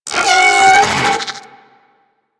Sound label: Vocal Attack; Warframe; Corpus; Arachnoid Wraith